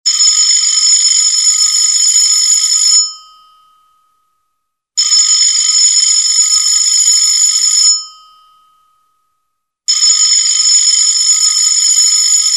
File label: Old Phone High Ring